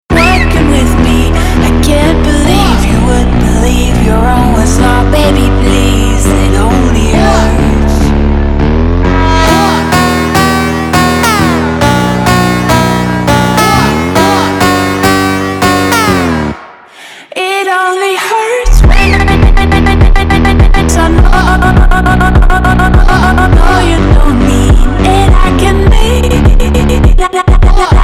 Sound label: Slowed